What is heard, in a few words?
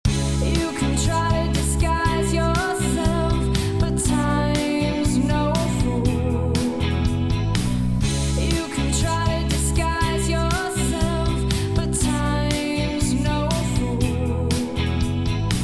Multiband; multiband compressor